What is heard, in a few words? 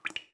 Water Drip; gamerip